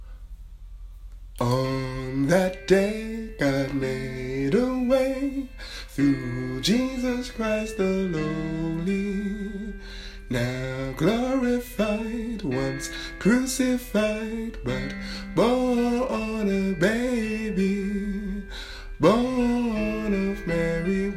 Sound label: VOICE